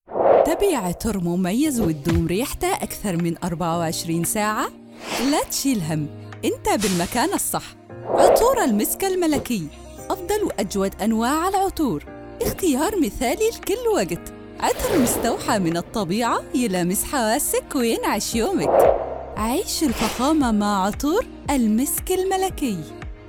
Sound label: musicvocals; عطور